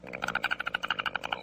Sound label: platypuss growl